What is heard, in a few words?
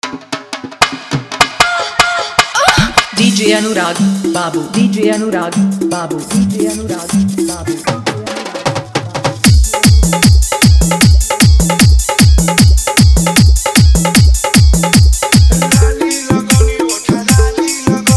Bhojpuri Dj Song Hard Bass Mix